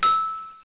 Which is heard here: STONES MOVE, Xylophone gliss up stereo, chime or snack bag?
chime